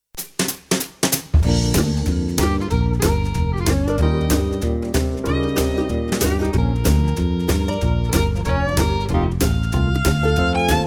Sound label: americana rockabilly